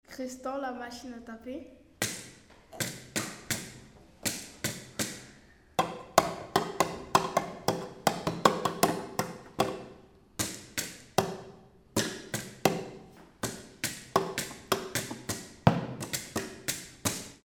machine a taper

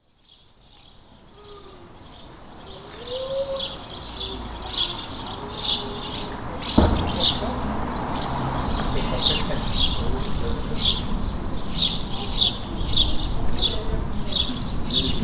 Moineaux et hirondelles; MZ-N710

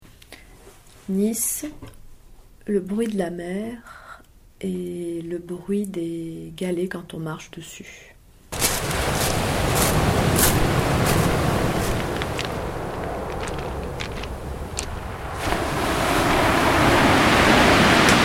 mer promenade des anglais

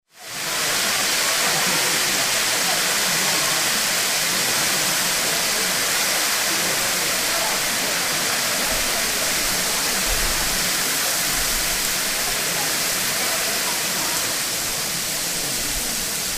ambiance cascade; cascade du dragon

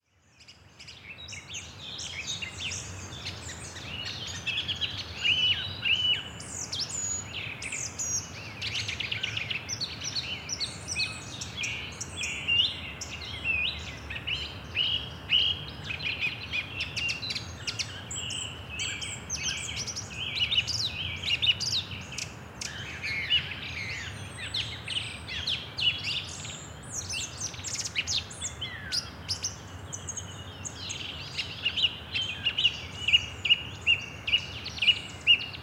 oiseaux

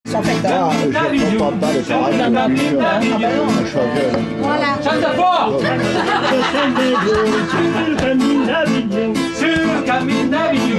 chante fort

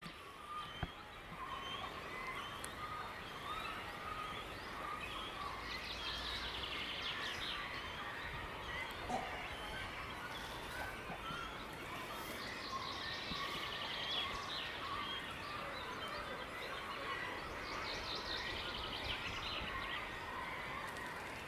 cormoran curonian spit

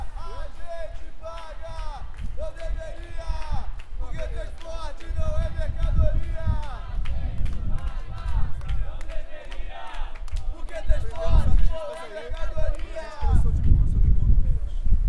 manif transport gratuit

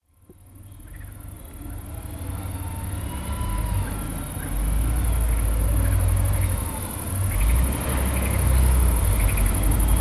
chariots elevateurs et grenouilles

